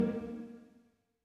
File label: Loop Tail; Good Job